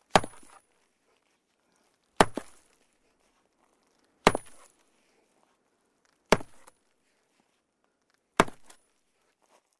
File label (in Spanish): picar piedra martillo